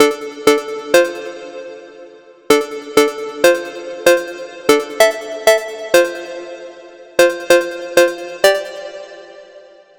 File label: Phonk Bell